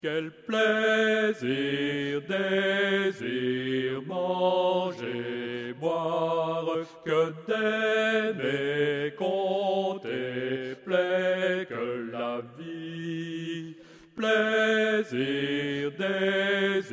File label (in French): Basse; les 4 voix ensemble